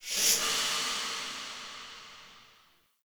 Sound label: Dissapear; Orlick; Friendly